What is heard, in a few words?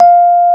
F 0, Electric Pianos; Wizoo Electric Pianos